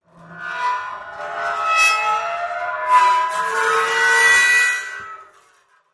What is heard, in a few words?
Dry Ice Eerie Metal